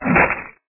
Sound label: bear skeleton attack